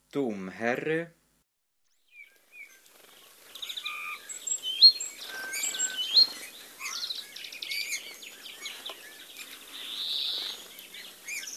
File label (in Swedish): Domherre